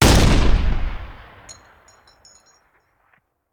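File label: Artillery Cannon Anti Aircraft; Sound Ideas; Guns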